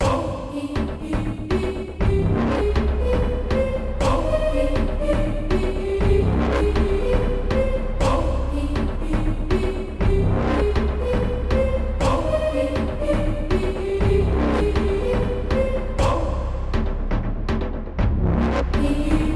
intense fight scene

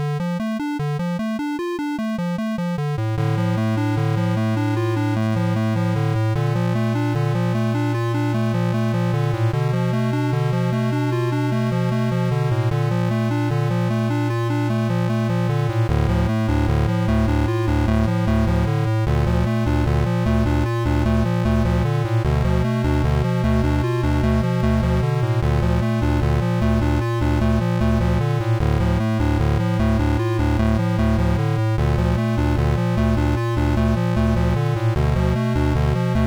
Mysterious Futuristic 8 bit Music loop

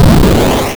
Explosion

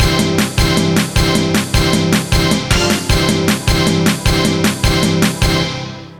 jingle

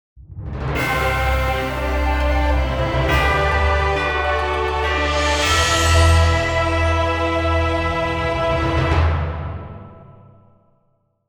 Intro Theme

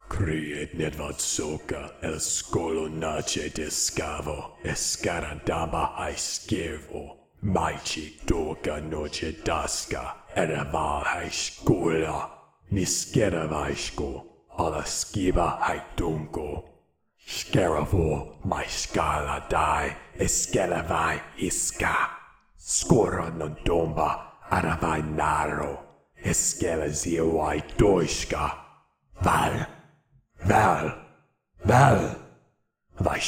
cultist chant

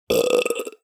burp

